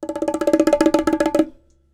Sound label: Bongo Roll